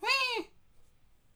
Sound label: wah; abstract; Free Sound Assets